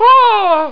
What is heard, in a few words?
ooooh!